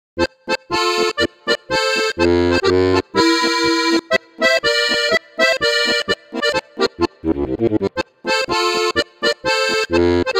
Helikon Bass Chords Steirische III chörig; ZUPAN

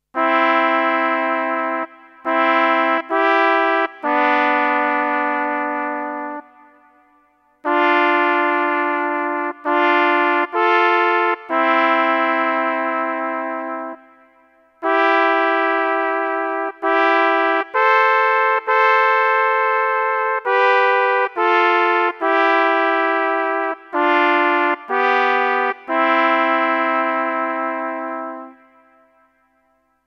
Flügelhorn SOLO

